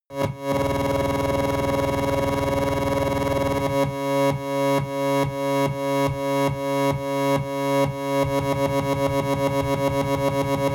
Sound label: sci-fi scanner; energie